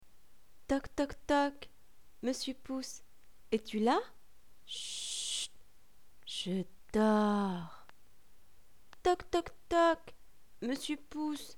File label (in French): Toc toc toc Monsieur pouce voix